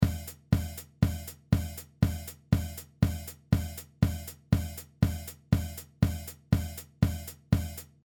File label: beat; コンプレッサー